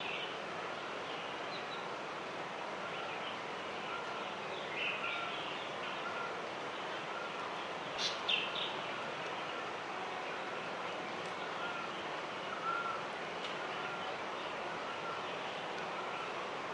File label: jungle day; ambient soundscapes; dota